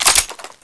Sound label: m4a1 bolt pull, weapons; csof